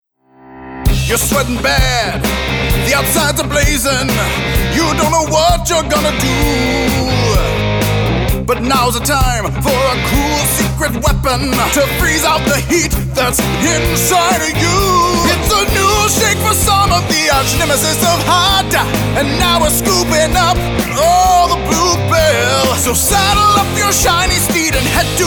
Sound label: Jingle; Jack's Coolberry Blast